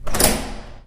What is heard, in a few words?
door latch; doors